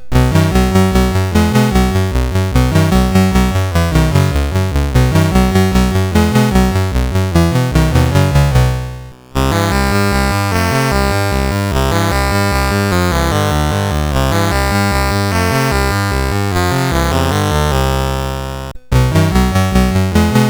Kumbayah, CSG8580R5

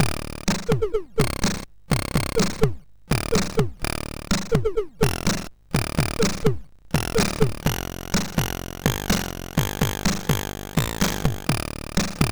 Power of Black Bandit, MOS6581R2

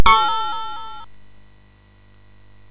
Fast Sfx, MOS6581R3; hvsc

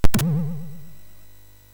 SFX, MOS6581; Narco Police; hvsc

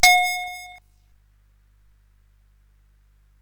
SFX; Narco Police

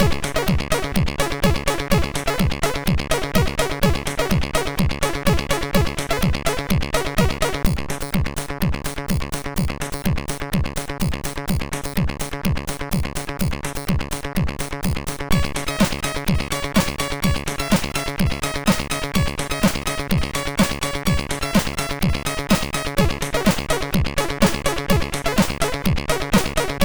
Koto Theme, sid MOS6581R3; Signor

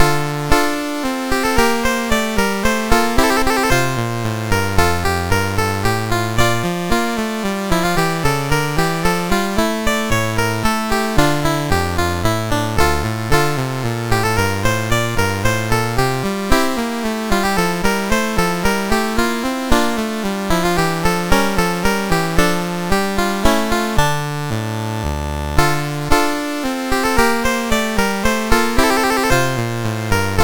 Menuet II, MOS6581R2; David W Tattam

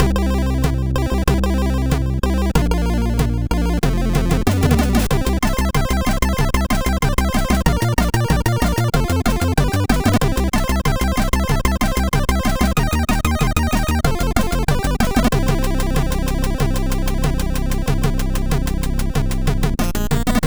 Rock, MOS6581R4

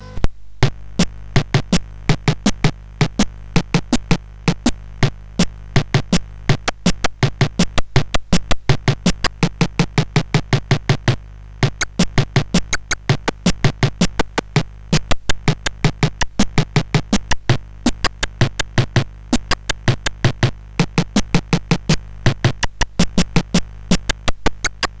Digidrum Concert, sid CSG8580R5; hvsc

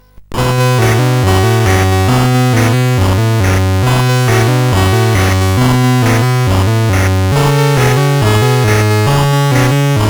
sid CSG8580R5, GAMES; Android 2

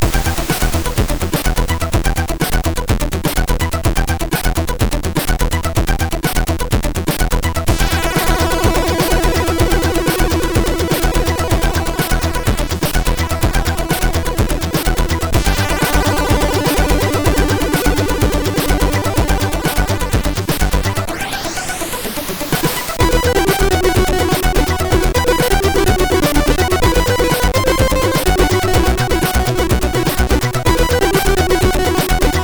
sid MOS6581R4; HVSC; Very Bland

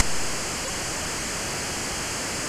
Project Firestart, MOS6581R4

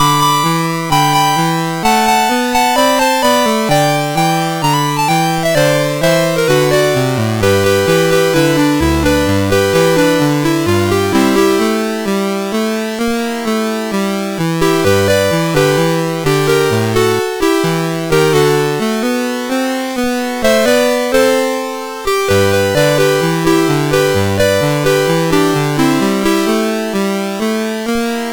Country Honk, MOS6581R3

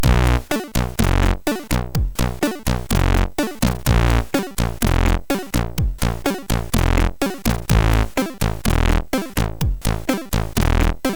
Tango, MOS6581R3

